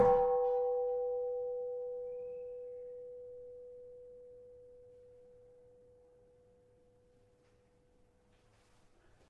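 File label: Keno B4, gravity harps tuned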